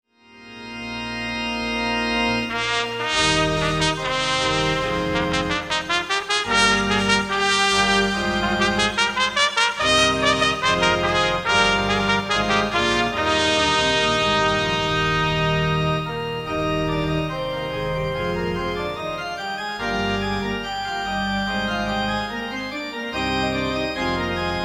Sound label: festive processional ceremonial